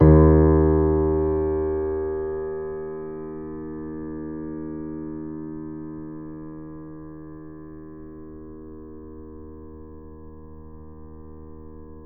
Grand Piano D#1 mf

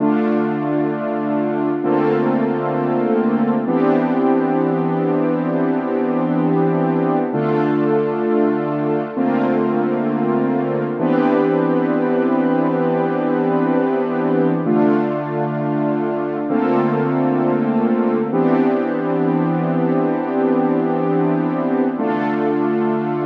Freeze Pad

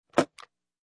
case luggage

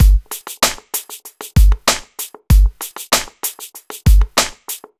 Bouncy 117bpm

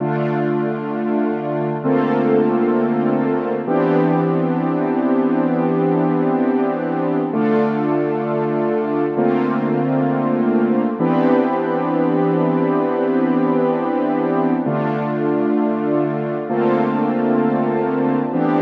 Freeze Pad

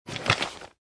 purse

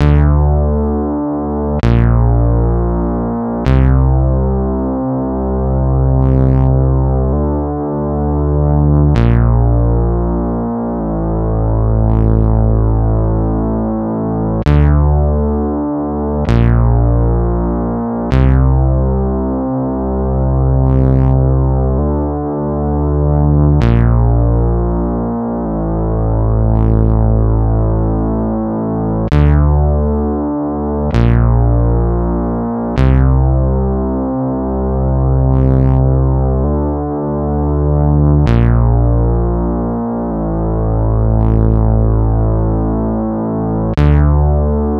Freeze Omni Bass

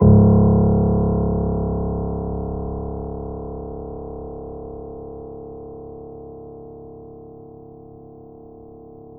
Grand Piano C#0 p; Ableton Live 9 Essential Training

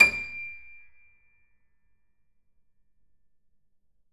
Grand Piano D6 ff

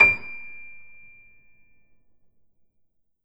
Grand Piano D6 mf

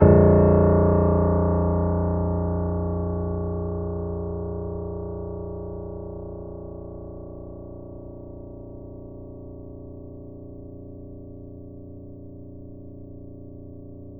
Grand Piano A#-1 mf

